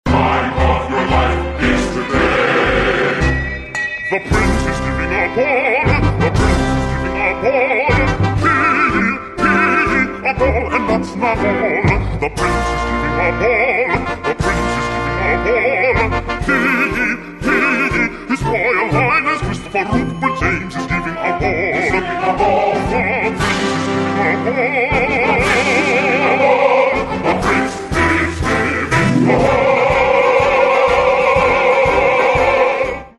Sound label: BASS ALTO